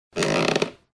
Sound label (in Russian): zvuki kresla kachalki